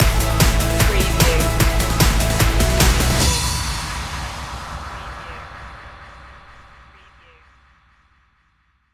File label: sparks of light stinger